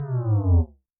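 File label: jungle bass; Yamaha DTXpress-II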